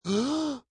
Gasp For Breath, Horror - human male gasping for breath, in shock; or badly in need of oxygen; Scary Breathing Sound Effects; LynneMusic